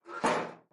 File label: breaker box close